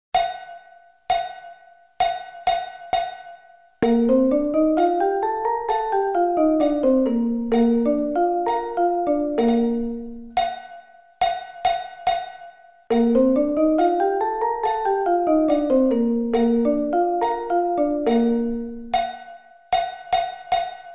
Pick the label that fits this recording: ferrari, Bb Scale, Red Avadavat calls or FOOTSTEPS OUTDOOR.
Bb Scale